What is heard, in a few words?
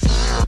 fonk